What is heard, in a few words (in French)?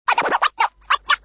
texture scratch